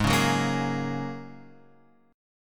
G sharp 9th Suspended 4th G sharp, down, Guitar, Open F; strummed; chord